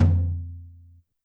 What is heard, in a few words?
Tom Brush, YAMAHA RM1X